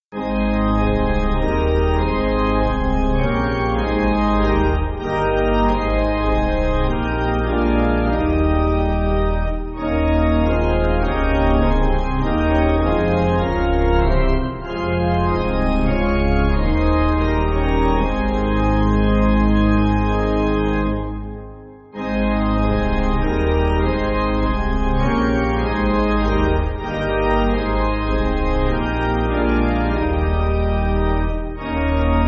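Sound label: My God How Wonderful, pipe organ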